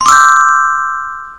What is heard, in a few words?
victory; singe; Sugar Rush